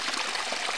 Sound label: Fountain Loop; Playable Tutorial Level